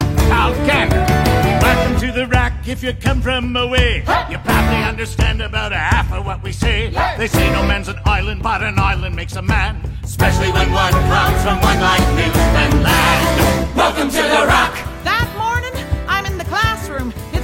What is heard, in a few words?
vocals